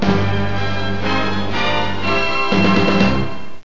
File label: End Fanfare; Special Delivery™ Tutorial